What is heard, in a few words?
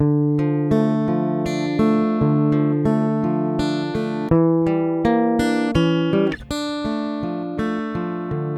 Guit out